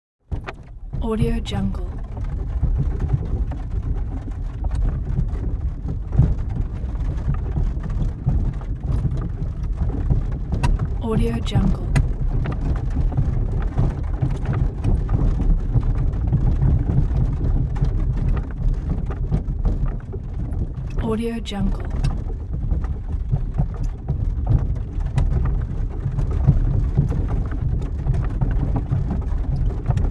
horse drawn carriage interior slow ride with start and stop